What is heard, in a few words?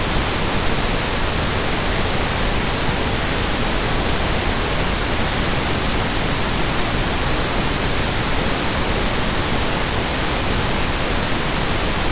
noise pink lr independent